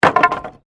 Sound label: Wood Movement Heavy; Material components for constructing more complex sounds; Materials